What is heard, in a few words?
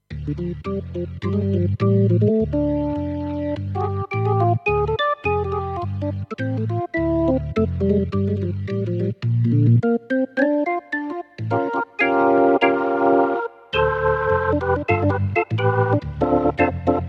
Jazz